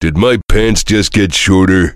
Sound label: heavy laugh happy; tf; duke